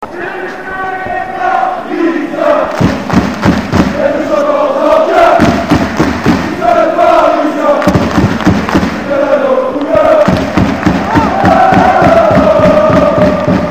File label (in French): Chants